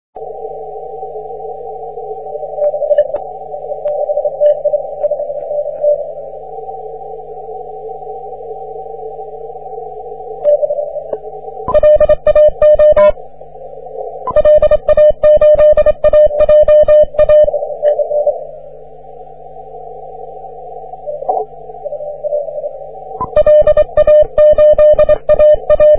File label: 30m cw